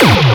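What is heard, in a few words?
player shot; Robotron; PC Gamer 39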